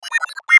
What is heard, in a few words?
DIGITAL BLEEPS WAH; Beep; Multimedia; Blastwave